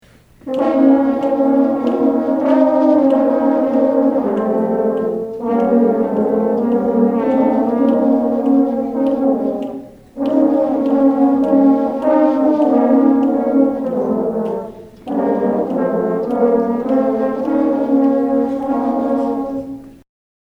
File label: Horns